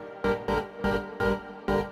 SYNTH INDST 125 A, Industrial, Keys

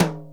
TOM, drum

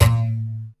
MARIMBURA; drum

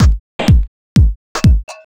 HOUSE BEATS 125

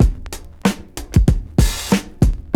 JAZZY B, 94 BPM loop; 0- 99 BPM

